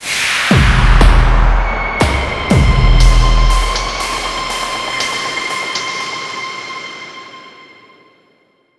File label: Mission Complete Success Cue; Venus; Warframe